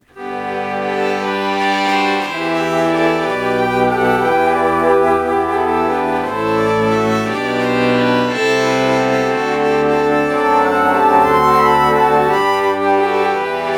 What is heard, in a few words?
alto flute violin morph